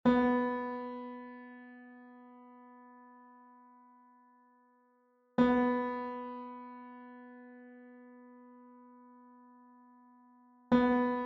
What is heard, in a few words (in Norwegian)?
stemmetoner for gitar b strengen b3